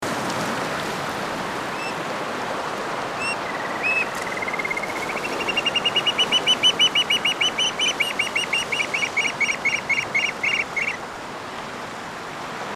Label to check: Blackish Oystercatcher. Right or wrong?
Right